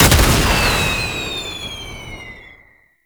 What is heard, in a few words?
Tank Missile Launcher; Enemies; Weapons; Warframe; Lasrian; LasrianTank